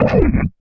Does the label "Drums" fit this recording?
Yes